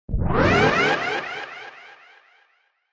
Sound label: Crzy Rush, Crazy rushing sound.; Rushes; Sound Design